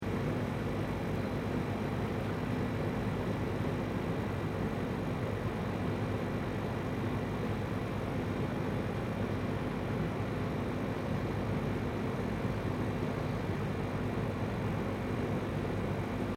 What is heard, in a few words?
Compressor Airconditioning External Operating Loop; Various machines